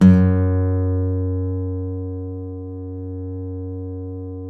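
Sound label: nylon, FLAMENCO, Guitars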